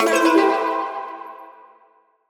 Impact FX

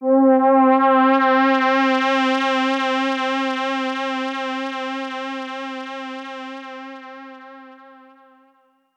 Memorymoog PAD Angel Low - C; Synth; One Shots; Cymatics; Fantasy Synth Sample Pack

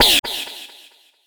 Techno FX 135

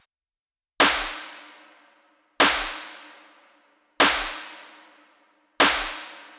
Future Bass Kit 150bpm Snare; Drums; EDM Drums; Drum Kits; Stems; Ghosthack; Ultimate EDM Drums; Drum Mega Bundle; Future Bass Kit 17

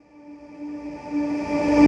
Tonal Reverse - D; House; FX; Archangel Tonal Reverse

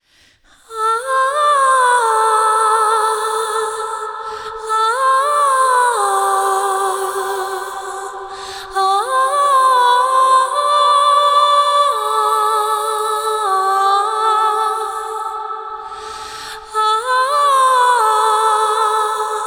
Top Line Vocals (WET); Top Line Vocals 2; Construction Kits; Am 60Bpm; Ghosthack; SkyRealm; Kit 03 - Winterhold

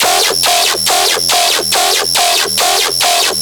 Bass Loop D# 140BPM, Dubstep; Sailing Bass; Ghosthack - AC2024 - Day 13 - Dubstep Sounds 2025

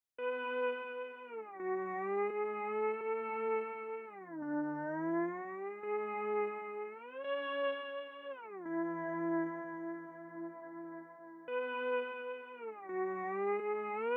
85 BPM F Min Bell; Loop Stems; Cymatics; Rushing

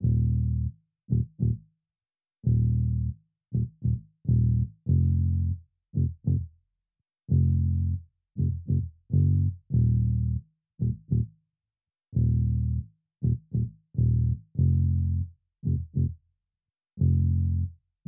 99 BPM A# Min Bass; Loop Stems; Cymatics; Infinity Melody Collection; Feeling Good

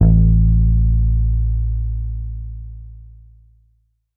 Lofi Bass Guitar, A; Cymatics; Lofi Starter Pack

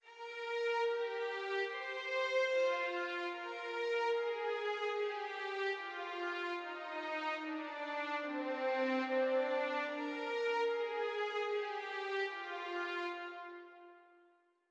147 BPM C Min Strings; Melodies; Loop Stems; Cymatics; Saga; PHARAOH Beta Pack; Pharaoh Premium Drum Samples (BETA)

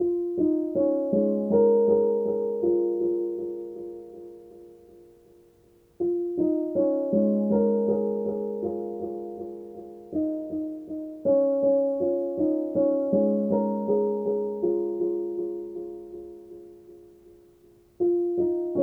80 BPM F# Min Pluck, Lofi; Melodies; Loop Stems; Cymatics; GHOST (Beta Pack); Blue Wish

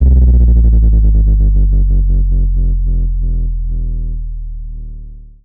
Sub Drop Saturate; Riddim; One-Shots; SFX; Ghosthack; Riddim Essentials Volume 2

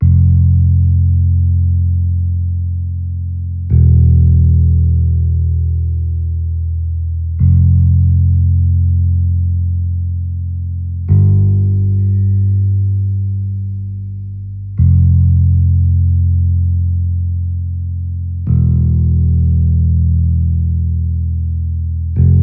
Bass Guitar Am 65Bpm; STEMS; Ghosthack; SkyRealm; Kit 27 - The Crossing Of Jade Sea

